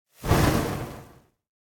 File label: lobby victory burn; smite; god daji skin15